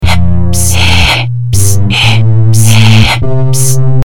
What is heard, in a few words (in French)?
sonnerie